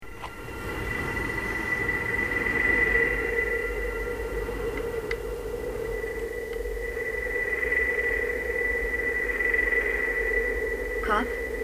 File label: scraping industrial